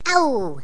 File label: auw; Raft Wars